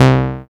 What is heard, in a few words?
SYN, Microwave; Sound & Vision - Gigapack I CD 2